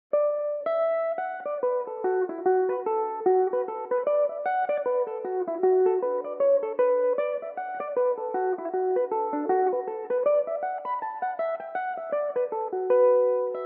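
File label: B minor, irish mandolin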